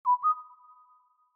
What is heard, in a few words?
message